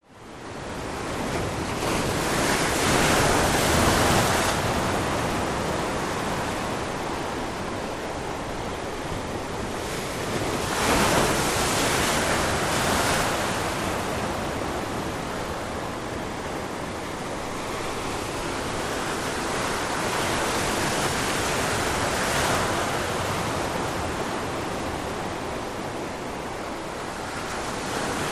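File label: Close Up On Mediterranean, wave crashes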